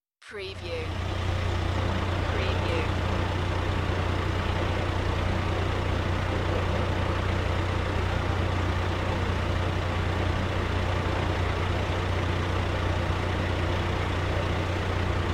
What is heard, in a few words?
Truck Engine Idle